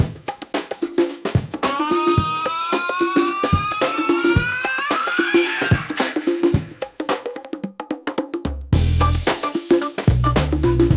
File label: Funky, free beats